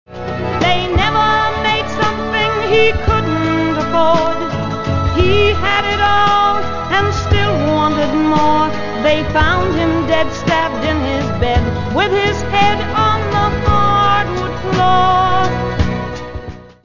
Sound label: sad song